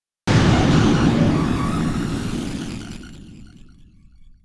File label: big snare; Psytrance; Drum Shots; Snares